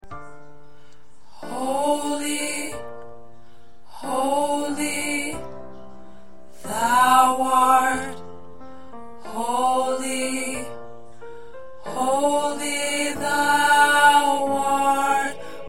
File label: Soprano